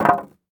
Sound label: Styrofoam Hit